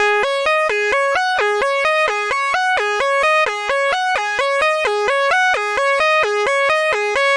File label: 130 arp wannabe bagpipe triplet; SYNTH AND ELECTRONIC LOOPS